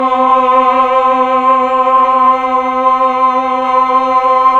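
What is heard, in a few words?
voice Mellotron choir, slow choir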